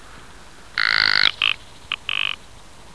Lagopus mutus

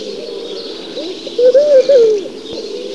Streptopelia senegalensis

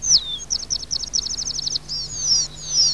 Anthus cervinus

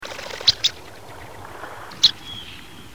Phalaropus fulicarius

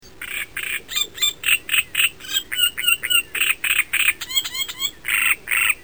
Acrocephalus arundinaceus

